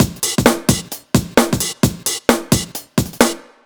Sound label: 131 Live Breaks Full, Drum Loops 131bpm; Zero-G; Soundclash Nu Dance Electro; Acidized Wavs